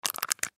Coffee Creamer; Package Empty Crumple Handle Movement Crinkle; Drink Mixing; foley; Drink Mixing Sound Effects

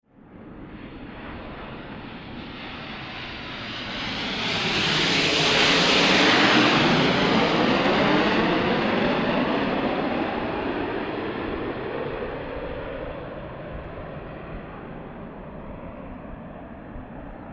Jetfighter Fly By; Distant; Airplanes; Vehicles

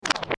Transition Crinkle Descend; Multimedia; Blastwave

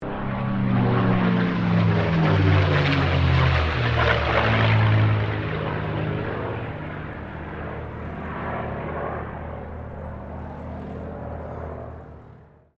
AIRCRAFT CAC CA-18 MUSTANG MK23 PASS STEREO; CA-18 /P-51 Mustang; Airplanes

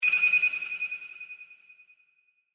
Sonar Blip High, Pitch, Reverb; Communication; BlastWave

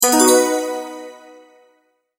multimedia tone advance

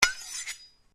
Blade Swish Low, Sword

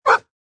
Dog Bark; Dogs (Sound Effects:Animals)

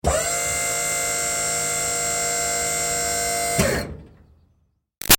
Servo Moving Truck Long; Motor Sound Effects; Machines; Big Room Sound

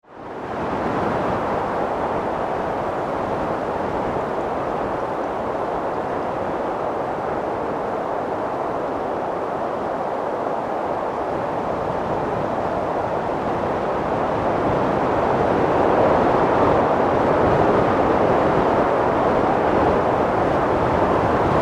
Wind, Storm; 5.1

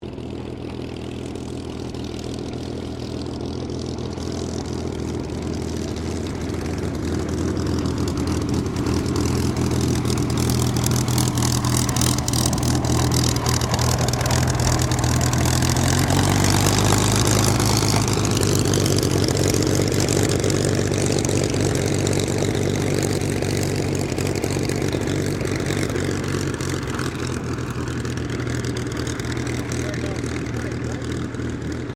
AIRCRAFT SUPERMARINE SPITFIRE MK-XVI TAXI STEREO; Airplanes

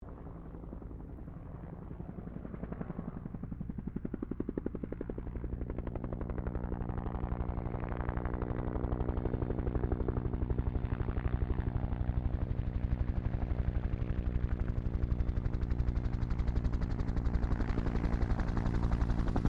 HELICOPTER BELL UH1 IROQUIOS HOVER PASS; RAN UH-1 Iroguios; Helicopters (Sound Effects:Vehicles)

